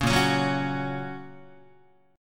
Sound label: A sharp Minor Sharp 5th, down, Guitar; 4,6,4,4,5,5; strummed; JGuitar